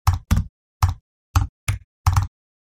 Simple text typing; shorts sound effects

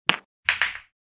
Billiards pool shot

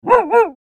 Playful dog barking bark

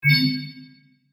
Action adventure game menu ui confirm

